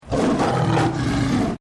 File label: lion roar; sam; the wild